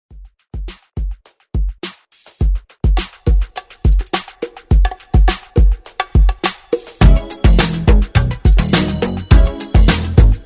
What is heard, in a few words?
80s ReDrum 104 Bpm; DJDOLLS